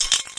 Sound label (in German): found sound dosen; UNUSUAL KITS and FX; Werkstatt Found Sound Kit